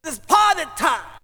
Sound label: Vocal; ZERO G - Datafile DISC 2